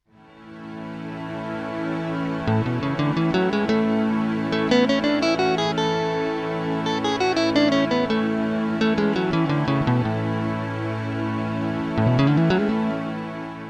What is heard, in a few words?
a major scale; fretjam